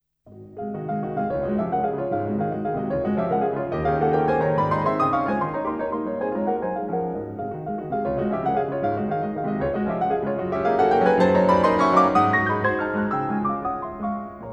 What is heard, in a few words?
Op. 25 No. 8 in D Flat Major, Piano